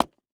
Emergency First Aid Kit Plastic Unlocking The Latch; emergency effects; AdobeCC